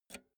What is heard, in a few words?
pull out jack multi socket quick; objekte; elektronik